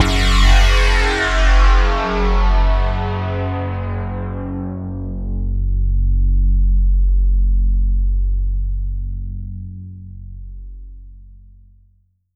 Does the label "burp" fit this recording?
No